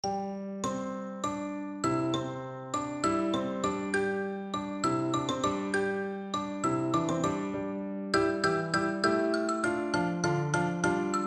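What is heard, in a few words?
xylophone, percussion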